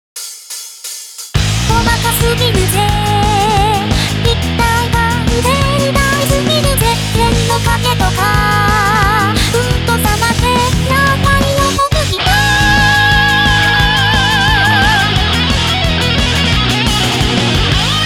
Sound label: Guitar